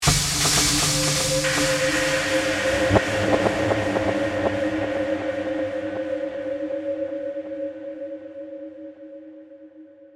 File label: Horror Accent, Percussion, Eerie; BlastWave